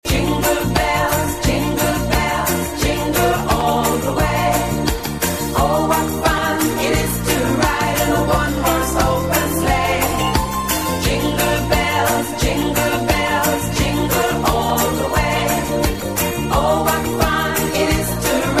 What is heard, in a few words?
jingle bells